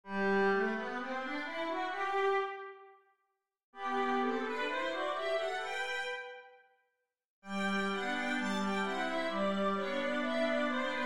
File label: etude, violin